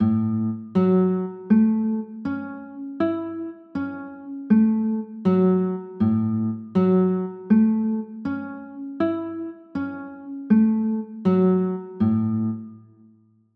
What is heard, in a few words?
d maj, chords; d maj9